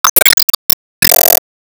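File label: Sound Effect Enemy Healer Heal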